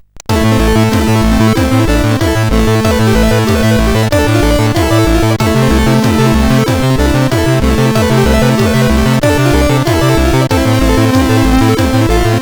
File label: Tentacles Intro, sid CSG8580R5